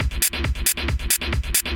Tech loop

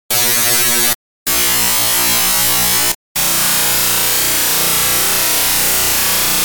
Comb White Noise

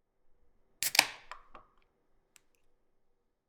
Open Soda Can Tab

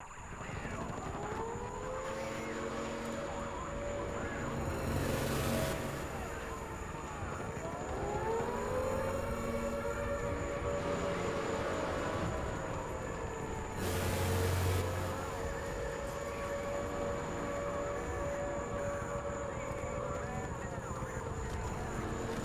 Alien Invasion Ambience

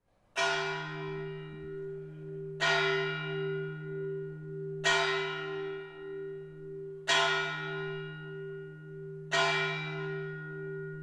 Tarifa Church bells

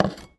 Lay Down Object

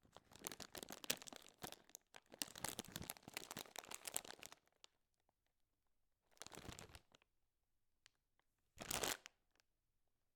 Crinklng And Opening Packet Of Potato Chips

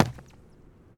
Soccer Stomp

